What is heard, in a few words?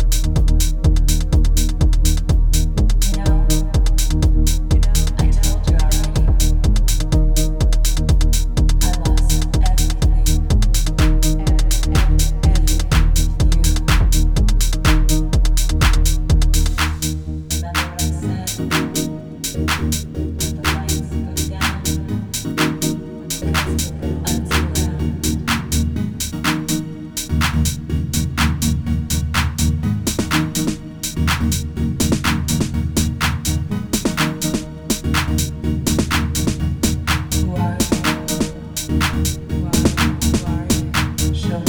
vocal mix